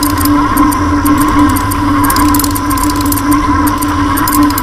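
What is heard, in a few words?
emitter spin loop; props; Source Engine; Portal 2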